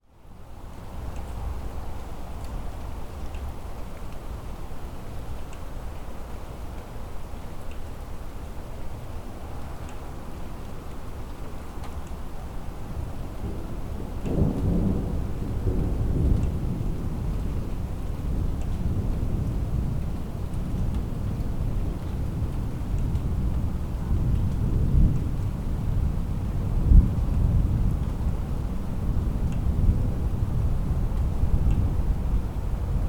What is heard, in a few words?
weather thunder distant urban distant traffic